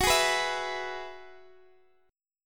F sharp 7th Suspended 2nd Suspended 4th F sharp down Guitar DADGAD